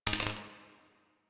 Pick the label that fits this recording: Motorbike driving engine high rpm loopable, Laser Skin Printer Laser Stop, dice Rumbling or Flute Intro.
Laser Skin Printer Laser Stop